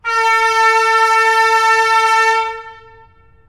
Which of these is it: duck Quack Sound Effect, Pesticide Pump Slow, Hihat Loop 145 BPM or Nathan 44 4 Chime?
Nathan 44 4 Chime